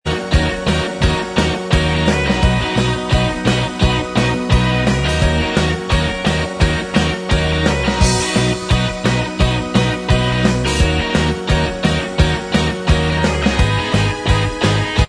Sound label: Inst